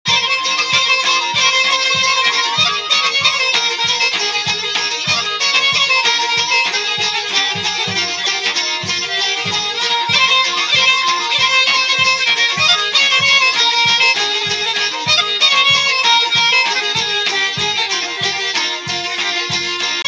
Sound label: steady 96bpm low; Bufcansko